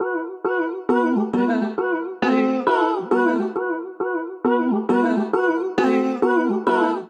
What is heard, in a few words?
Sliced Melodies E Minor 135 bpm; MIDI Clips; Ableton; Factory Packs; Voice Box; Harmonic Racks